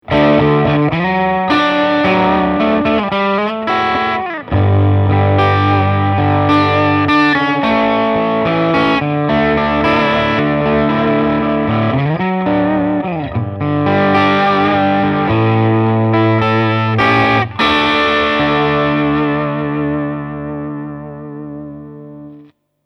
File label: music man 112 rd